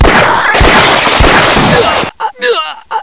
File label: bathroom fight